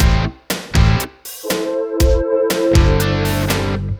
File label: pop80 D2; ringtones; Google; NewWave Labs